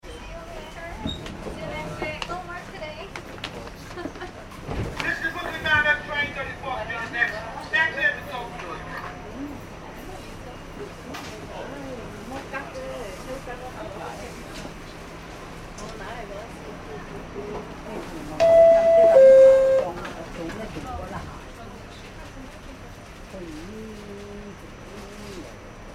transportation train subway start run stop internal nyc; Subway Train Running Between Stations (Onboard/Internal); Vehicles; McKinney Sound